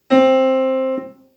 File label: piano C